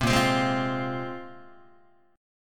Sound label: A sharp 7th Suspended 2nd, Guitar; strummed; JGuitar